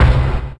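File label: Bike knock; Forsaken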